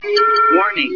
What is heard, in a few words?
warning